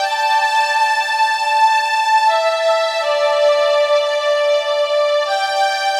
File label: Sweet Strings